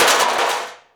metal bin hit

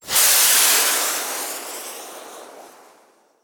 rocket taking off; detonation

